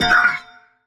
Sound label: pain, robot; Learn to Code From Zero With Godot